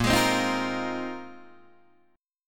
Bb 7th Suspended 2nd Suspended 4th Bb Guitar DADGAD, chord; Bb7sus2sus4; strummed; 8,11,10,8,8,8; JGuitar